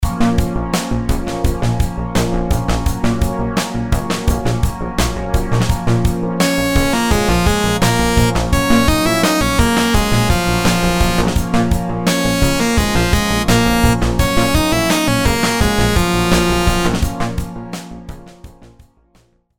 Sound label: rhythms simple dotted 8s 85